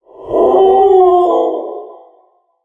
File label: Enter Play